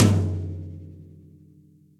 Tom Floor High Cold Tight Room; Drum Hits; Standard Hits; Ableton; Factory Packs; Session Drums Studio

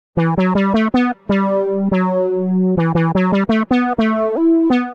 E Minor 87 bpm; MIDI Clips; Tonal; Melodic; Evening Casual; Ableton; Factory Packs; Chop and Swing

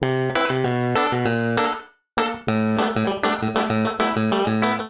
Fuzz Clav Chords A Minor 97 bpm; MIDI Clips; Accompaniment; Ableton; Chop and Swing

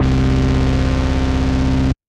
dropped A bass; Ableton; Factory Packs; Chop and Swing

